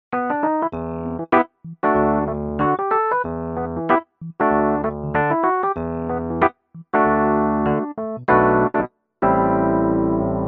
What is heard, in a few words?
Artis SE EPiano